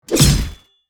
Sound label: Spear impact medieval armor hit